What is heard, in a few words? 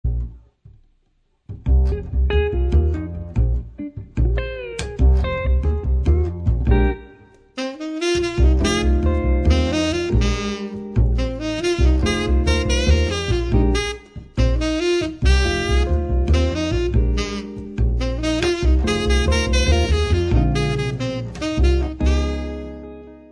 trio